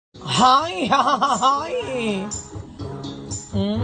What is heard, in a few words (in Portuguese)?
risada